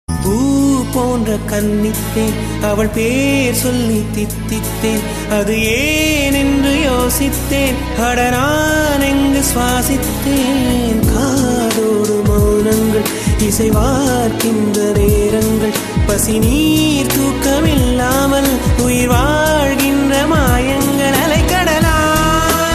Tamil Love Ringtone

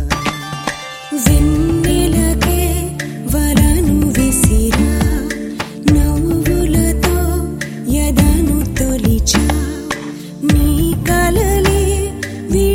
Female Version

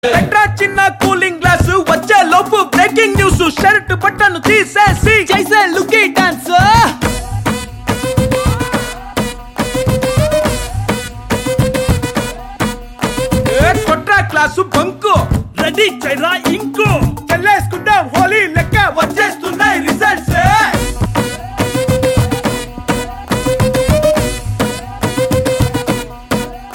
Ringtone

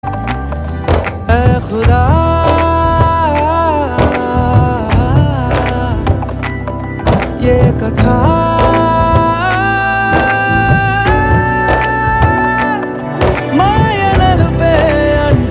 Ringtone

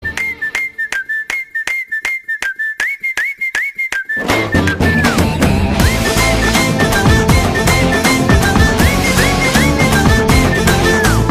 Flute Ringtone

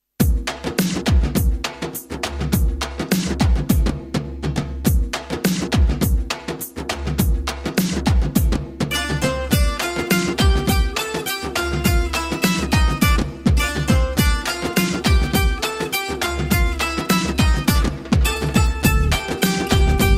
BG Drum Beats

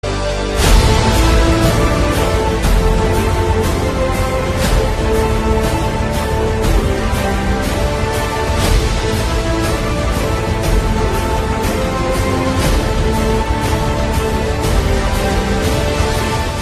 Monster Love Bgm; KGF; Hindi

